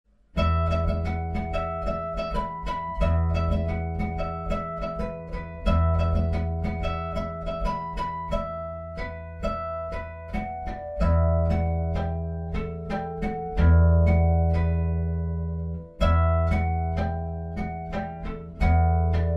happy harmonics

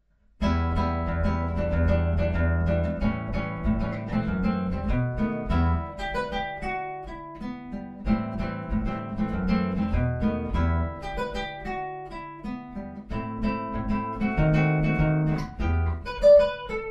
bossa

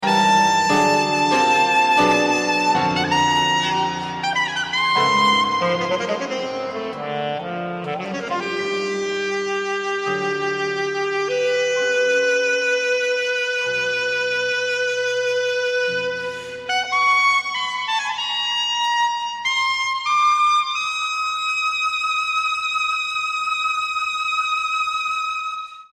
sax

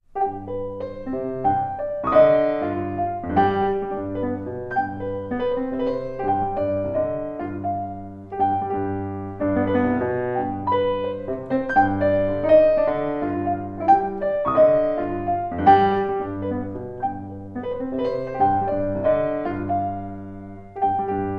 piano study

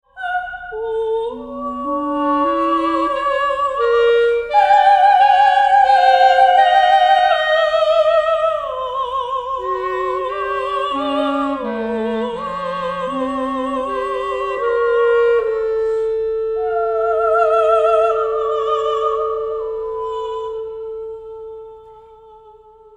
Vocalise

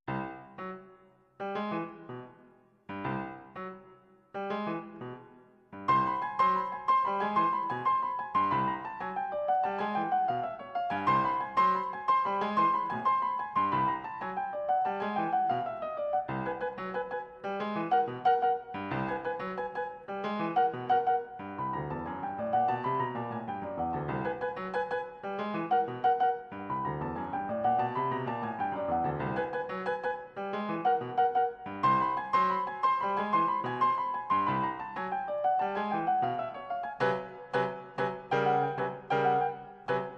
Sonatina piano

